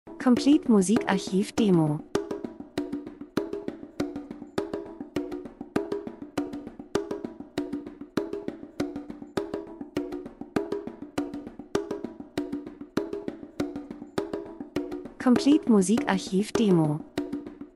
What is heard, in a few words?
drums; Drums und Percussion Afrika Traditionelle Trommeln Sounddesign Land und Leute Buschmann Reisefilm Natur Dokumentation